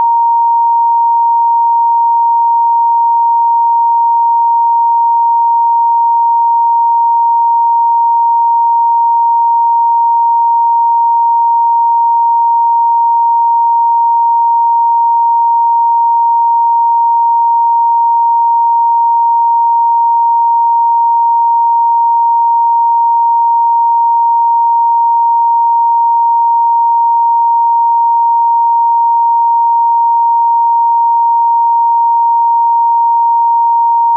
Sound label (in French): sinusoide